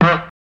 Bulb Horn Honk

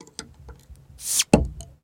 Bottled Drink Open; eating & drinking

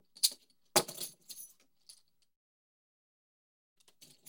Tokens Jingle Metal

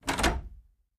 Attic Closet Door Clo

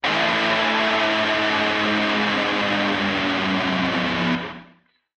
Music E Guitar Weird

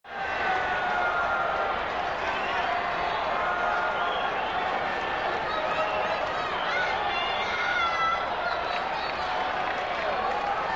Arena Crowd; Crowd, Active Claps To Cheers.

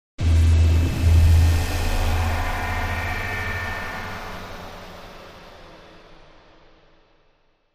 Wash Metallic Thump R

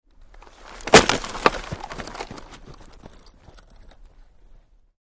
Football Block Run Aw, football

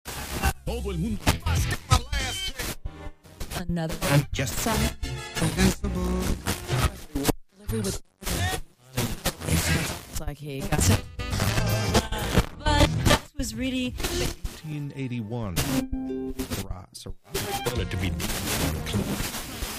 Tuning FM Broadcast, static

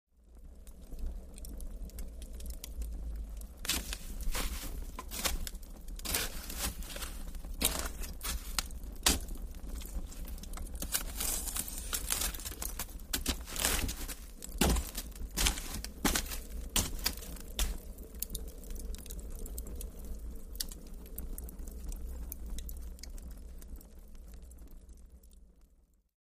Fireplace Stirred with Poker. Fire Burn.

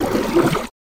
Canoe Rowing Reversed, Right Side Only

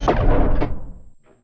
Latch End Low

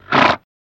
Horse Snort Generic

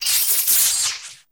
Lightning Crackle

